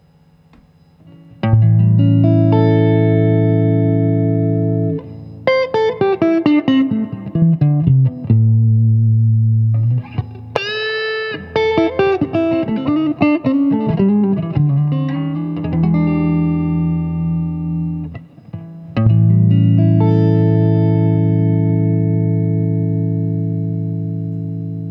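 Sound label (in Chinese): 60S MWS 美产铜线 清音 3档, acoustic guitar; kindman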